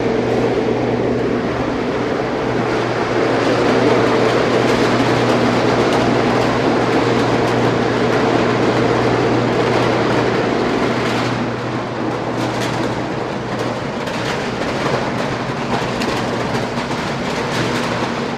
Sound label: Banging Machines Am, factory